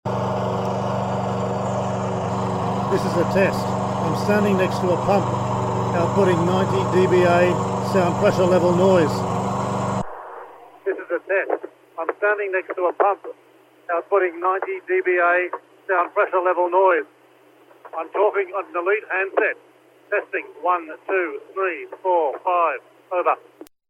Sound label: Fire Pump Noise suppression